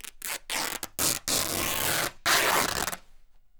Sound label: rip, Canvas Bag Rips; E-MU Producer Series Vol. 3 – Hollywood Sound Effects